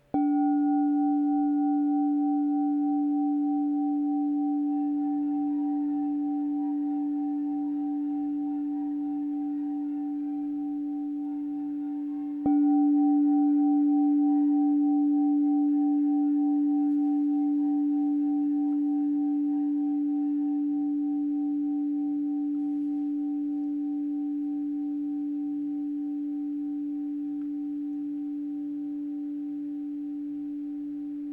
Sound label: 7 inch D -15, crystal bowl